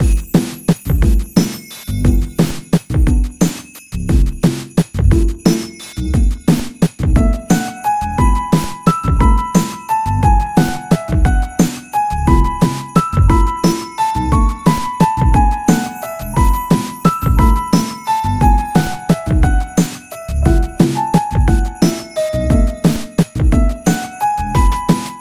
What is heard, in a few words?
Xmas Loop